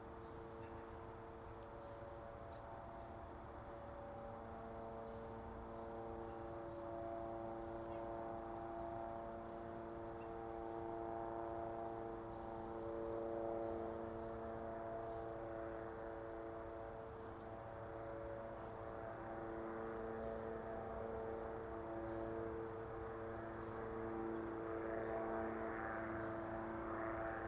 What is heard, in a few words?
distant plane